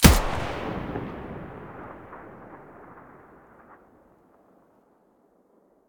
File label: mdr muzzle mono, weapons